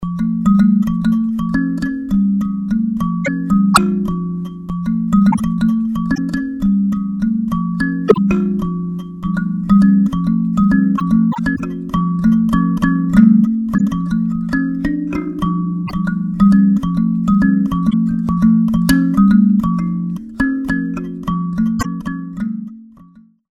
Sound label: Kalimba